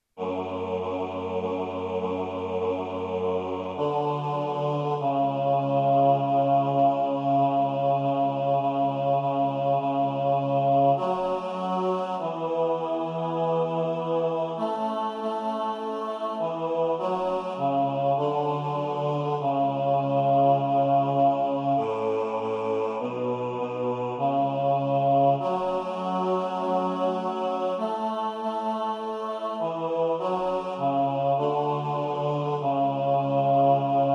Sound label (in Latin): Adoramus te Christe basszus